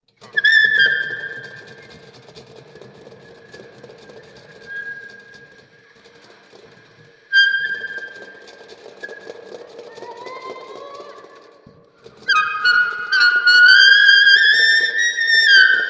Haunted Sax Sounds

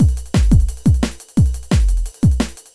Nu DnB Loop

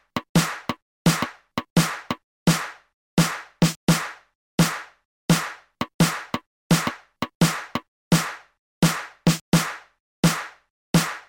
Jump Up Jungle

